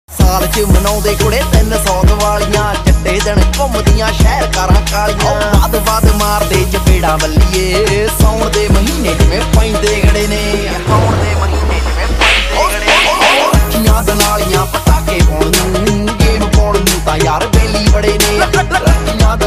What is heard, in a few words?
punjabi ringtone